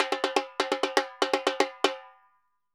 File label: Repique Poly 120, Drums & Percussion; Best Service; Festa Latina VOL-1